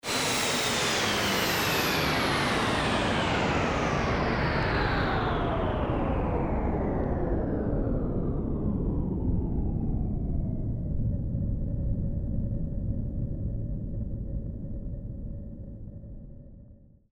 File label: shutdown engine element